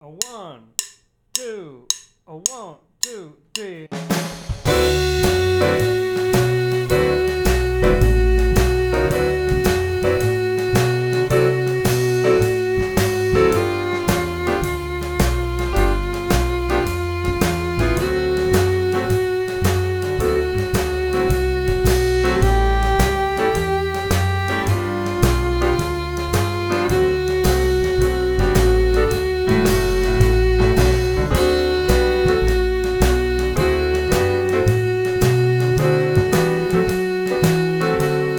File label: Play Along Inner Melody In Whole Notes